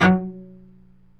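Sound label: F#2 SPICCATO, CELLO; Northstar; New Gold VOL-2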